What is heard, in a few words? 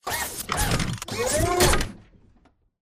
bt combat walk to idle; Titanfall 2